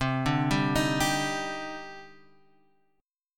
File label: Eb Major 7th C; Ebmaj7/C chord; JGuitar